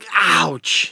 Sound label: hit; NWNDS